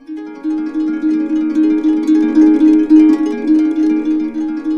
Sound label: BB, Harps; Complete Harps; USB Soundscan vol.62; AKAI